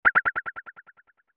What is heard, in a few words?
dial